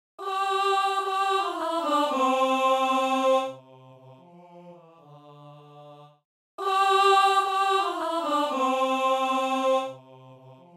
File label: Alto